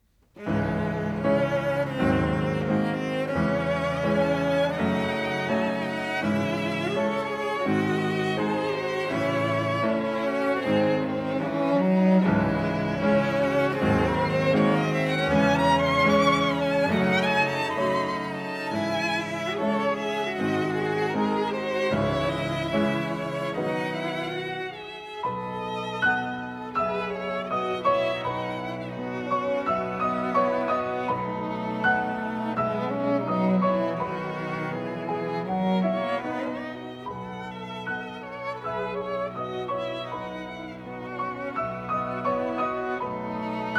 Piano Trio in A Minor, Variation IV L'istesso tempo; Ashkenazy; Perlman; Harrell; Tchaikovsky